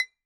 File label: Bottle Struck